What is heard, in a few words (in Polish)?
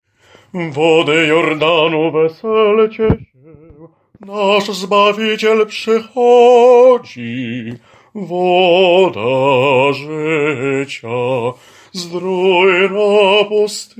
BAS; Wody Jordanu